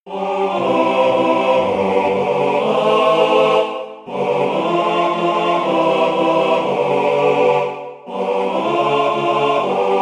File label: MIDI SATB